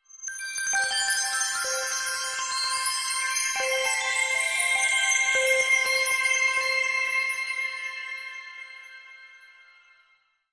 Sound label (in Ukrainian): peredzvin dzvonykiv